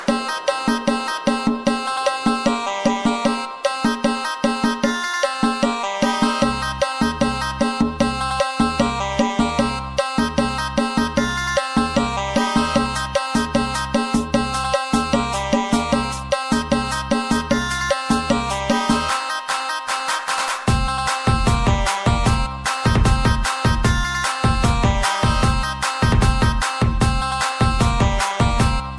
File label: NonStop Funky